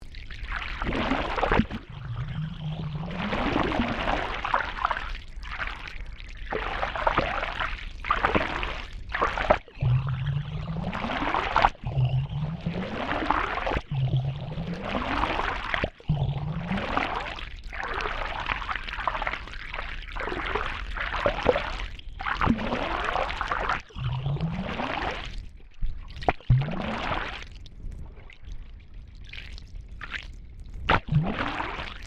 Boat Small Engine Inboard Wake Submerged Stereo, Inboard boat engine running badly